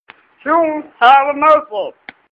jingling baby; children

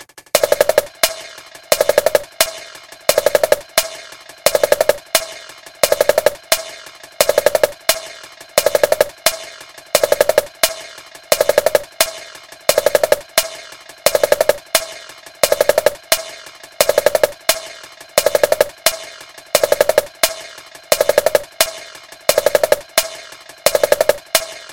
Beat, ringtone

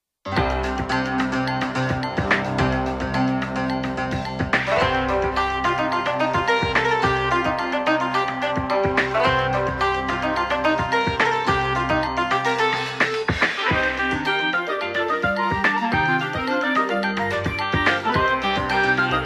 Mysterious trap

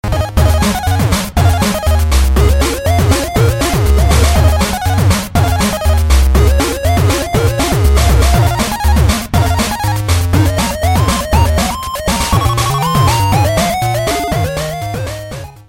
Maple Leaf Rag 8 bit

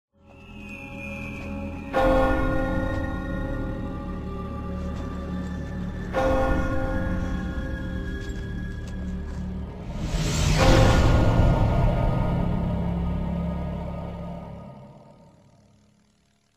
bell

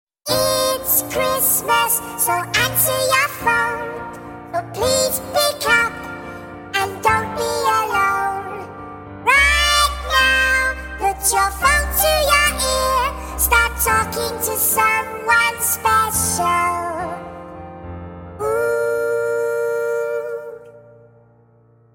Chipmunk X-mas

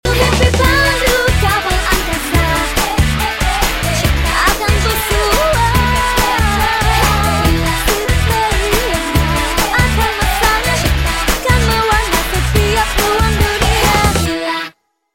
Oldies

